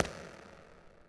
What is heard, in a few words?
marble; ambient; Shadow Man